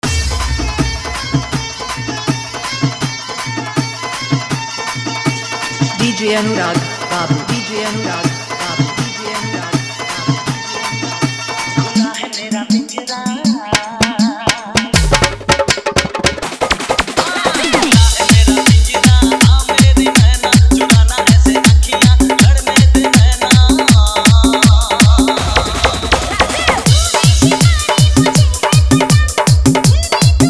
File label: Full Dhollki Bass Dance Brazil Mix